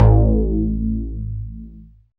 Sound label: dance bass; Sound & Vision - Gigapack II CD 1